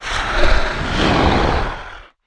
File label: Dragon Attack